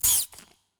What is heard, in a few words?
Spider Attack